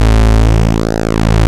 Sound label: PULSEMOD, ARP Synths; E-MU Formula 4000 Series Vol. 3; Analog Odyssey; ARP Synths II